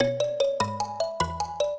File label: minuet, mbira; Mozart